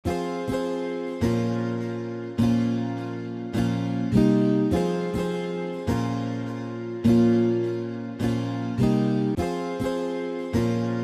Afro Guitar Loops Fm 103 BPM

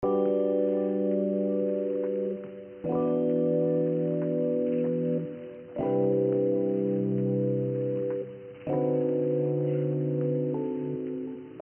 Lofi Piano Loop Gm 83 BPM

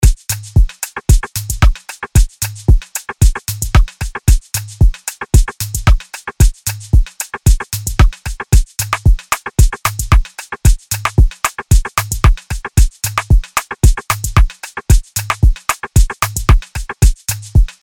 Afro Drum Loop 113 BPM

